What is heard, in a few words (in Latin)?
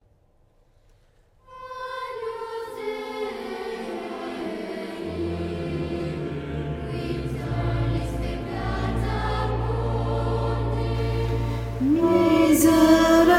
Agnus Dei CONTRALTI; Lourdes 2019